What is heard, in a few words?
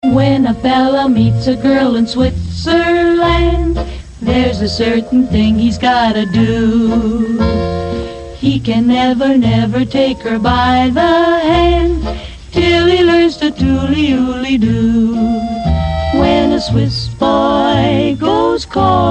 Yodel Polka